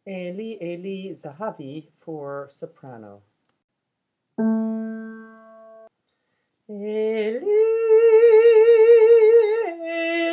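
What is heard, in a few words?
Eli soprano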